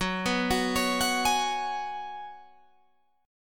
Gb Suspended 2nd Suspended 4th Gb, down, Guitar, Open G